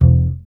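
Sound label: string contrabass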